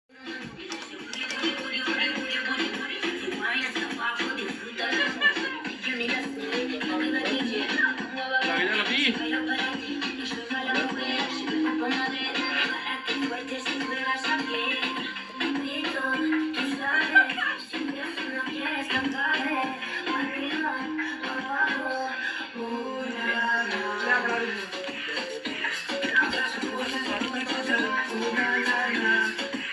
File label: Cha Cha